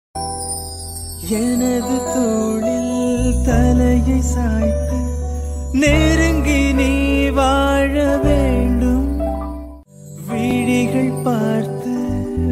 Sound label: tamil ringtone